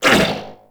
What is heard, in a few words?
Corpus Struggle; Warframe; Amalgam Override